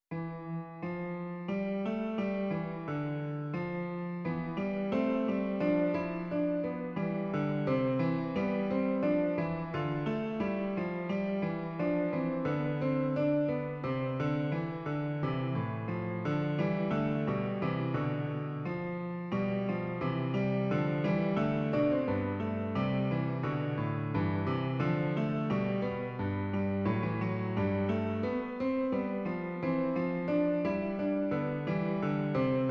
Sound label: Fuga